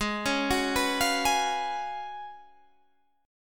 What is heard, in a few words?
Gb Major 7th Suspended 2nd Suspended 4th